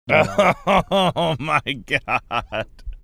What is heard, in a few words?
laughing oh my god